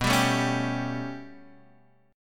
B 7th Suspended 2nd Sharp 5th B down Guitar Open G